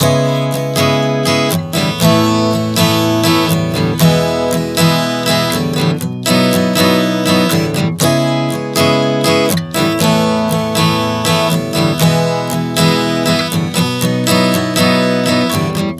C#m7-A-E-B 120 bpm, acoustic guitar chord progressions